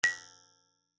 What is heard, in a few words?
Button Spring Sml S; buttons & beeps